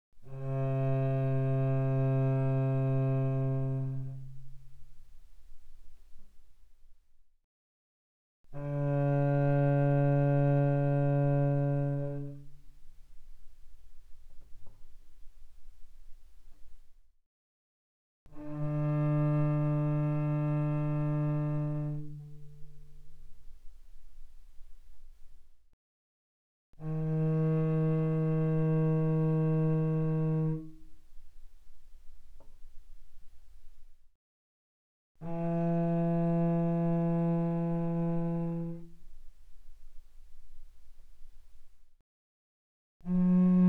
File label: Cello arco mf sul C C3 C4; Strings